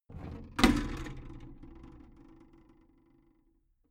big plastic case lid lift heavy

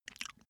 cat eat single

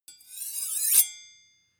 sword blade grind ring

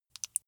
flesh wet fast squish single

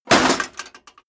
metal plate armor hit

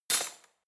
cutlery spoon drawer throw

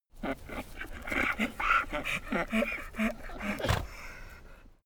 donkey grunt snort

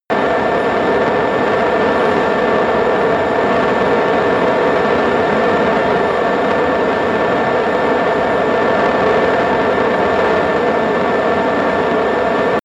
military jet fighter turbine steady

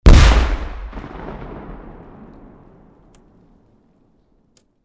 large explosion

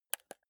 console controller joystick press slow

